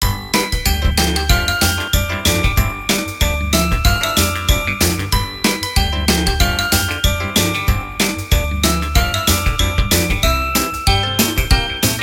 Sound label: groovy ski